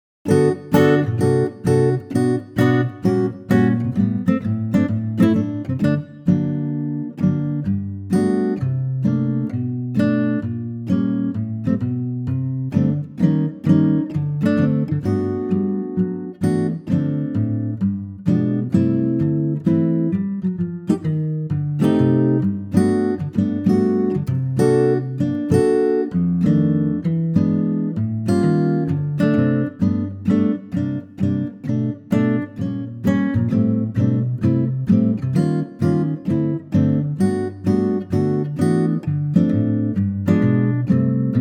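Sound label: G acoustic guitar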